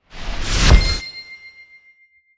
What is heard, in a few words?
chest Free Spin Won; lost relics